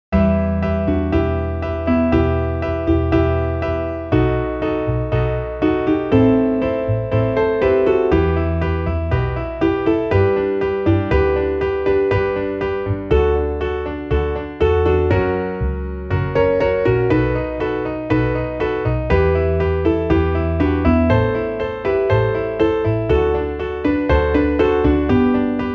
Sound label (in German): klavier; samarkand; Auf der Steppe ziehn von Norden — Samarkand